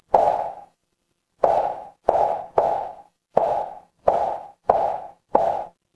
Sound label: footsteps heels